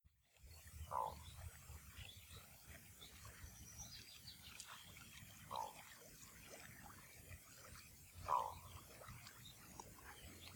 storlom lock; fågelljud

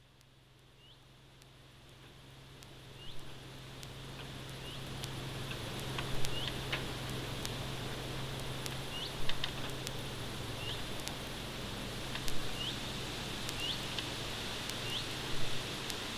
rödstjärt; fågelljud

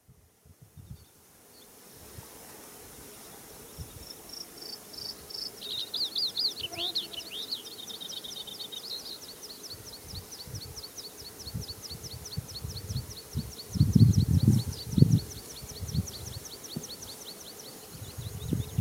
blåhake; fågelljud

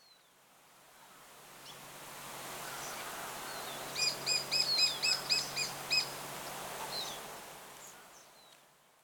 mindre hackspett; fågelljud

